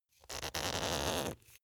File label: glass bottle close aluminium lid tight